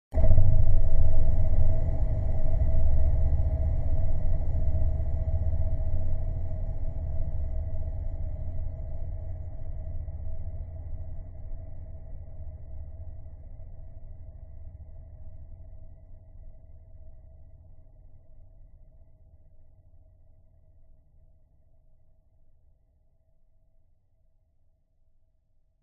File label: horror hit soft dark evil clunk long reverb